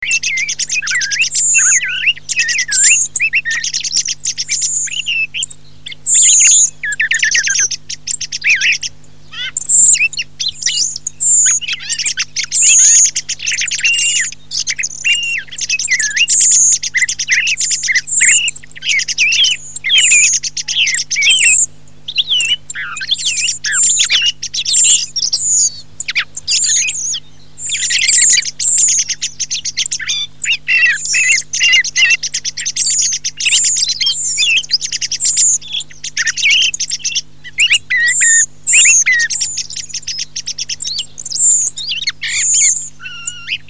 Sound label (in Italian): TORDO; CANTI SAG NATURE 2024